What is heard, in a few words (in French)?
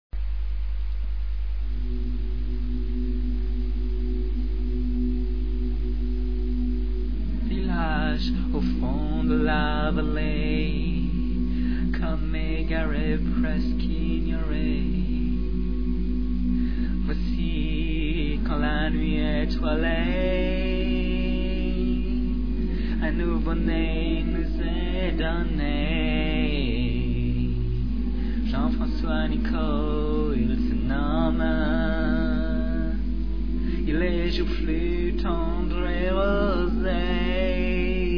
cloche